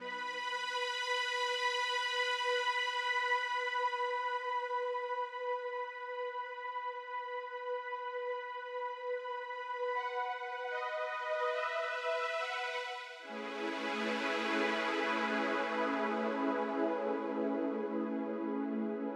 swell pad, Smooth RnB, F#min

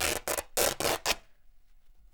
RIP, Canvas Bag Rips

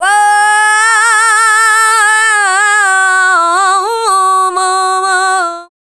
female dance vocals; Best Service ProSamples vol.12; AKAI

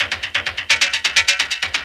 percussion, 130, drum loops

